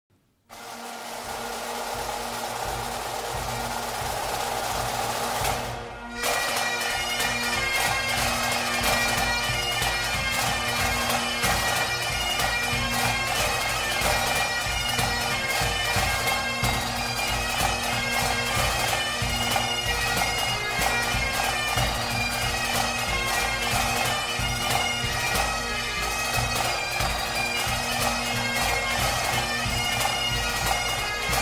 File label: bagpipes drums marching Irish